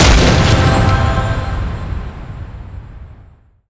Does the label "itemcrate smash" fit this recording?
Yes